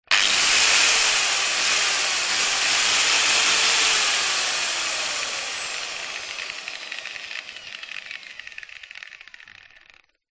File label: industrial angle grinder short burst with revs not cutting